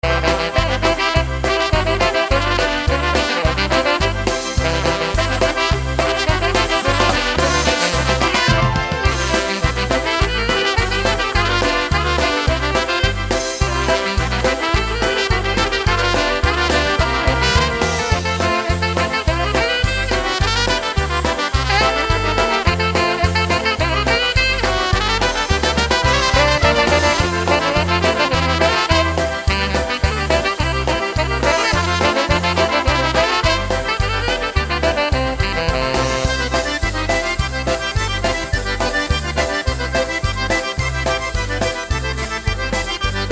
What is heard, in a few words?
Polka